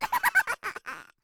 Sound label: death; Dd2 kobold flyer